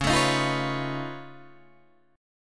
Db Augmented 9th Db down Guitar Open D

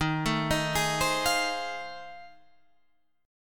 F 7th Sharp 9th D sharp

